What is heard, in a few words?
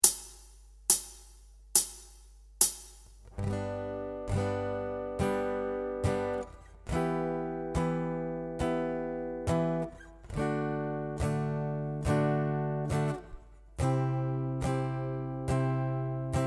Chord Voicings